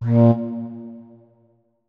flute notes; Hideout Furniture

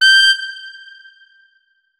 notes, saxophone; Hideout Furniture

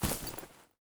grass, human step; Soundscape Overhaul

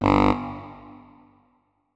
notes, saxophone; Hideout Furniture

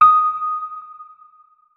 notes, keyboard, electric piano

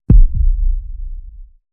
springboard shield, anomalies; Arrival

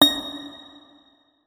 notes, guitar; Hideout Furniture

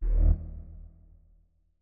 flute notes; Hideout Furniture

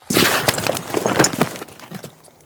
heavy, Vaulting; ledge grabbing; Ledge Climbing Sounds Redone

